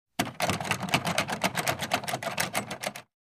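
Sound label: Door Knob Heavy Jiggle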